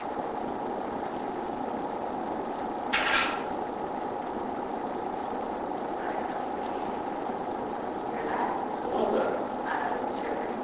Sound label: Dining room noise